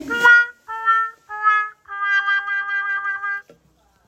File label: Womp Womp On Muted Cornet